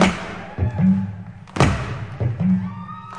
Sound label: 075 ethnic simple and vox, DRUM LOOPS and BREAKS; 000 to 080 bpm